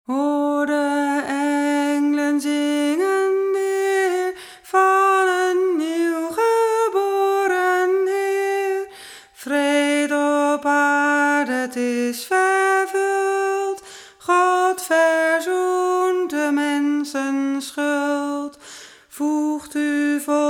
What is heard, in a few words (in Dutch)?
Hoor De Englen ALT